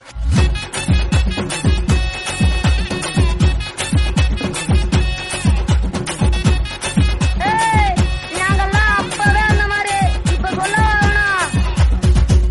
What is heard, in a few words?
best tamil beats; ringtone